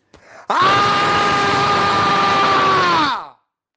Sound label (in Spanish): yo gritando aaaaaa